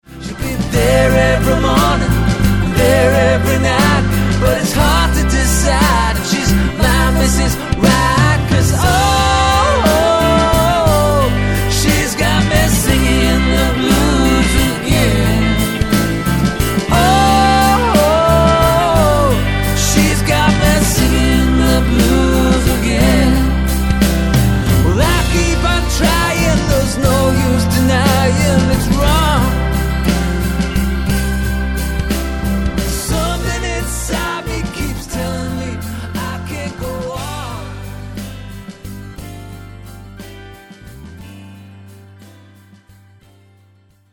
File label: Singing